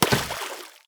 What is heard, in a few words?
damage hits explosions, Warships